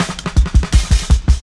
Shuffling Live Cut, Jungle Drum Loops; Zero-G Jungle Warfare 1, 2 & 3; 90 s Sample CDs

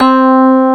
ELEC RHOD C3, Piano; ELECTRIC PF; ELECTRIC PF#1

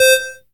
Dance Mega Synth; Best Service; 90 s Sample CDs

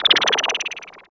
NOISE; East West - Phat & Phunky

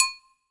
AGOGO HI, HR-16 DRUMS; EdgeSounds

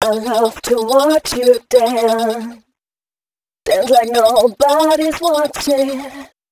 female c# 138, hooklines 136-140 BPM; Mutekki Media; Dance Ultra Voice VOL-1

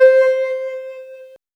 LEAD R; AMG - Vince Clarke Lucky Bastard

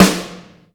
AMB S, HALL KITS; Crypton - DrumBase5000

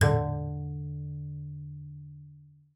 B LEG D3; BASS COL LEG; Xsample VOL-5

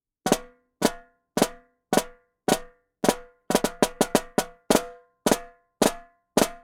Jungle Snare, African; Sonic Foundry (Sony Creative Software); Continental Drift Aftershocks

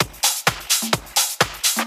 Discohouse Loop 128 BPM

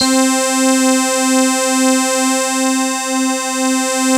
C4, synth line; 90 s Sample CDs; NiceBeats; SynthLine VOL-3

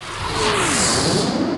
reverse; Techno Trance Essentials; FX & ATMO

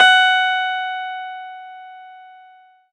CLAVI, Disco House; Best Service Dance Mega Disco House; CLAVINET

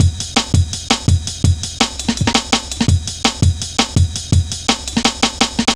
jazz ridez, drum loops; Zero-G Jungle Warfare 1, 2 & 3

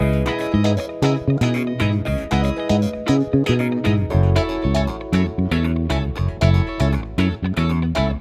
Backing, Nu Disco, Cm 117bpm; Zero-G; Construction Kits; At The Mansion

